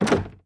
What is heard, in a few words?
quick Stop; mythic maiden